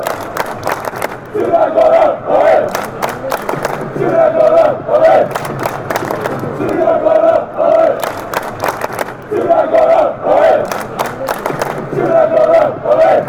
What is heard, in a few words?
montenegro chant